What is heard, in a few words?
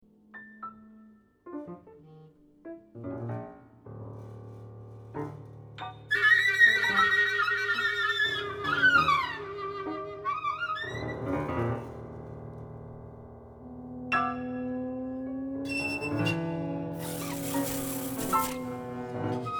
piano concerto; cage